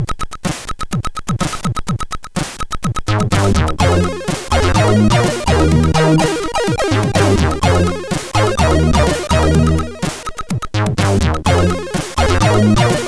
Jingle, sid CSG8580R5

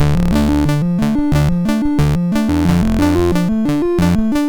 sid MOS6581R2, GAMES; Fantasya

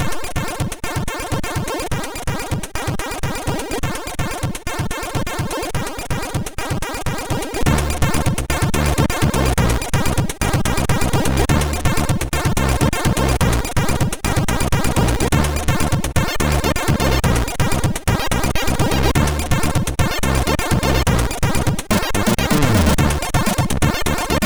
Bossa ntro, sid MOS6581R2; Early Tunes

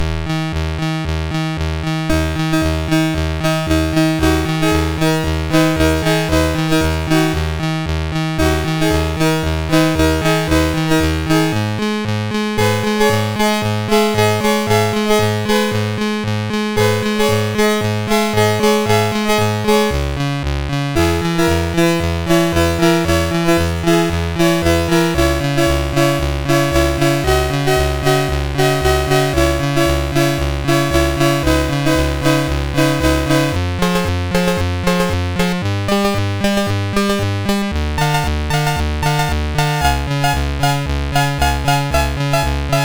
Music sid MOS6581R3

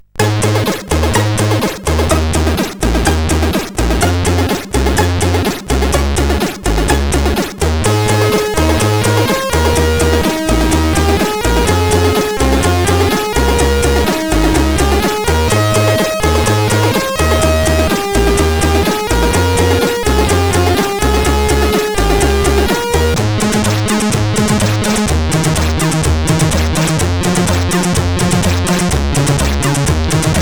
Music Box tune, CSG8580R5

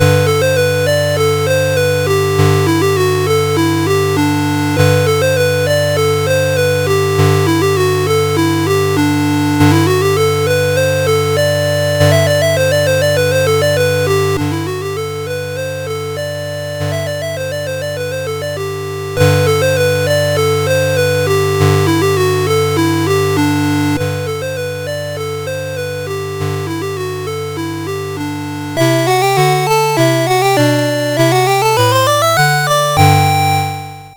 Country Dance, CSG8580R5

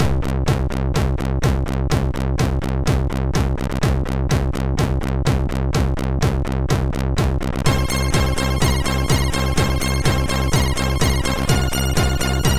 MOS6581R2

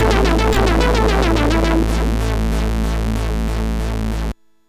sid, MOS6581R4

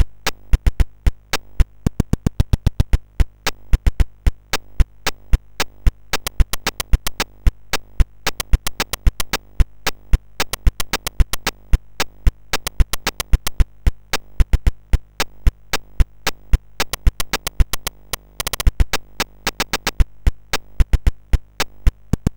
Mini Digi Drum, CSG8580R5; 512 bytes BASIC

